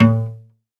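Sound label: SOLO CELLO, Synclavier Strings; ILIO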